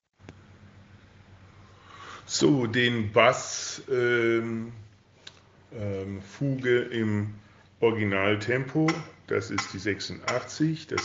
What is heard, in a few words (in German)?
Bass originaltempo